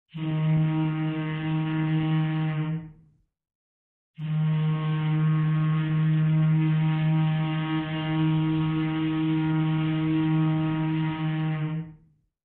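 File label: submarine horn sound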